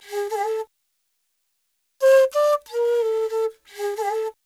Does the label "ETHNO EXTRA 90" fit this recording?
Yes